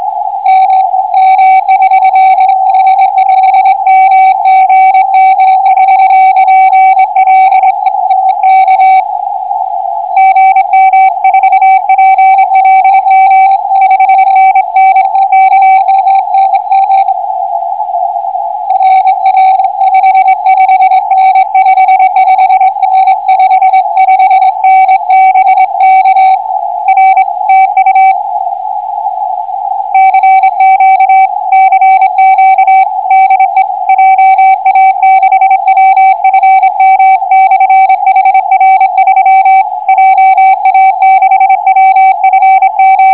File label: cw; JA6WFM; 6M; GI0OTC 50Mhz, VHF/UHF, HF Utility and Military monitoring